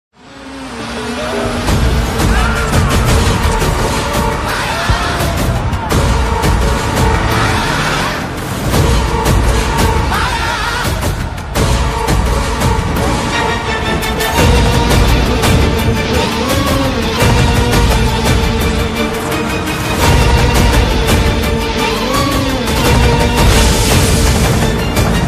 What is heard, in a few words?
villain bgm tamil horror bgm; demonte colony 2